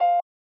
Piano Timbrally altered Notes; Piano Nudes

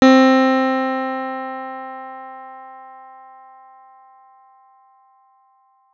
C4, pianos; Modelling sound generation in pianos

